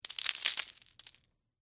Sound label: Texture, Debris, Spill, Sugar, Cone, Waffle; SoundEffects-BlastWave